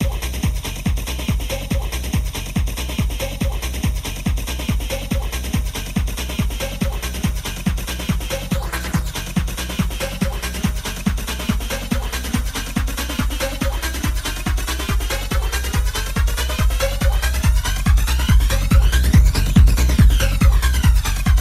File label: flanger